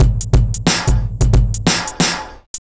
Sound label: distorted kit, drum loops; hh drum loops